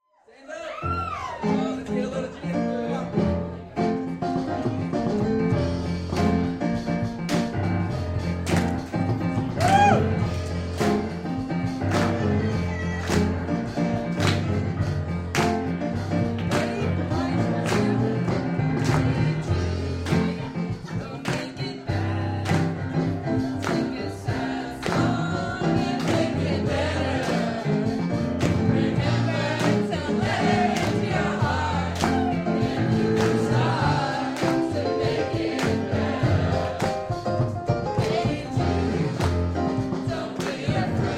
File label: funk short